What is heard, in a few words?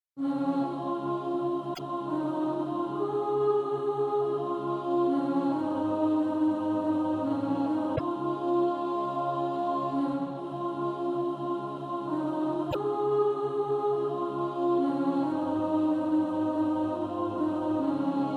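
soprano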